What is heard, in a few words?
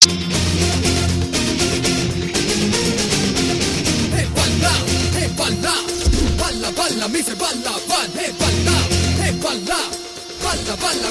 Rap Theme; Vallavan